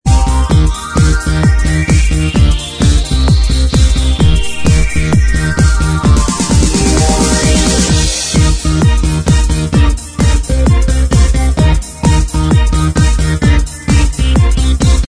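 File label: dance remix instrumental, backing track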